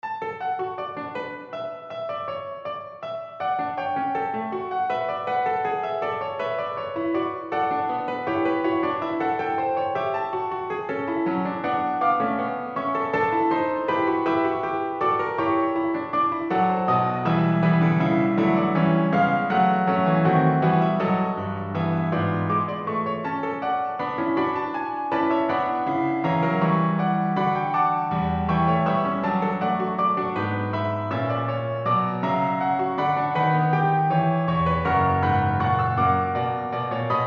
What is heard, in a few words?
string quintet